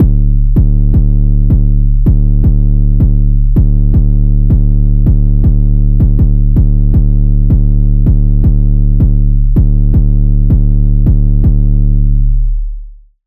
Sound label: 808, Sylenth1; 808 - Vice Squad; Routed Sound; Sylenth1 Expansion - Street Vanquisher Vol.1